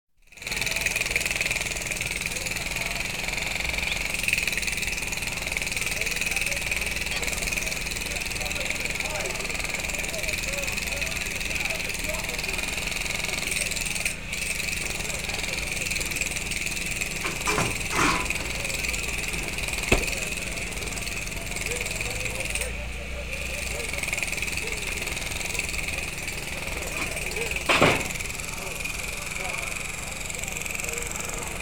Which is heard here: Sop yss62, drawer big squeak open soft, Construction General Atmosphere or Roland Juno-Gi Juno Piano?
Construction General Atmosphere